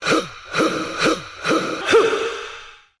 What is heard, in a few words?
"huh huih huh hoah"; ooh ahh; heh huh